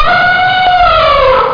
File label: ELEPHANT